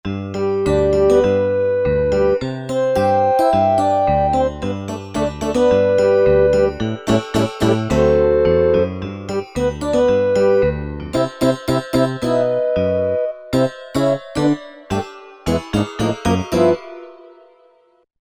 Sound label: Folkloric